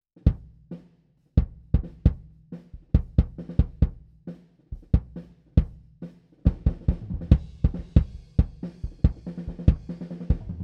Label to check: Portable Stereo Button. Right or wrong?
Wrong